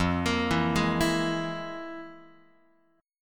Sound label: F Minor Major 7th Double Flat 5th, F, Guitar; FmM7bb5 arpeggiated; x,1,1,2,0,3; 4A,4E,5A,5D,5G#,6C#